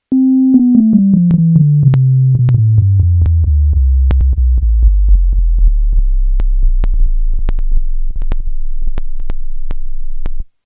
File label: 128 samples per wave